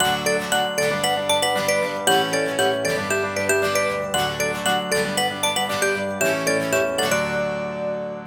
Countryside; Xiaomi Mi-CC9; ringtones

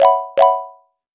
Knock, alarms; LG Qua tab PZ LGT32

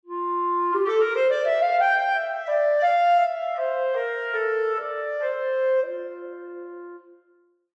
Clara Alarm, Clarinet; HTC

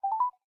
Call Connect; Samsung Galaxy Note 7

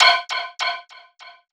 Radiation Alert; Sony Ericsson; C901; PlusFourSix; Lars Edvall

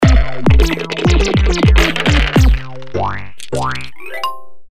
dice; LG KM555e Clubby; Game

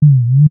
Reflection Drone; Apple iOS 18; Tunings; D94; Haptics

